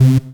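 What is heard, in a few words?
Rave Synth, Dance Mega Jungle Rave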